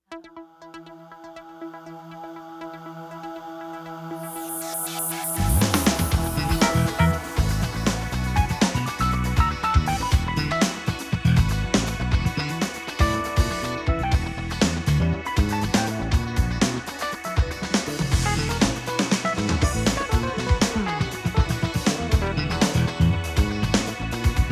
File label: Motu funk; Tplanetmusic; Custom MIDI / Backing Tracks; PETER RITZ / R&R Entertainment